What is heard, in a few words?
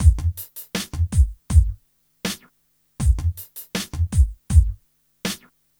Smooth, R&B Drums